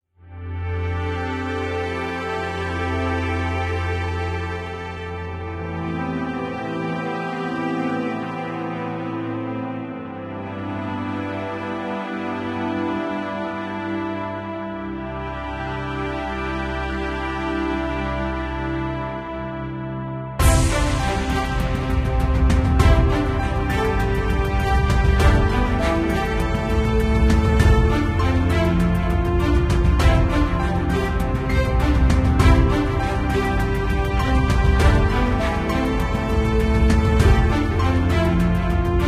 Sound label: caves